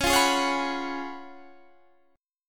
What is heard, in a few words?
F sharp Major 7th Suspended 2nd Suspended 4th, C sharp